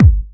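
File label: BD Trancy, bass drum; Takebeat; Club; VEC1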